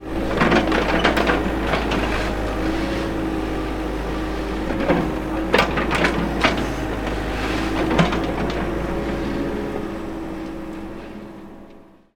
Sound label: Excavator Bucket Shake; Mechanical Wave; Excavator Sounds